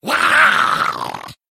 male murloc attack

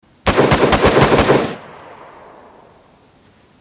rapid gun; dayz

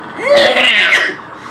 reeeeee

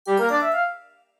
wand noise

